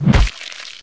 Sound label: claw strike, bear